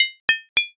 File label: armor